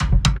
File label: perc, Drums, One Shots; Slate; AURORA Sample Pack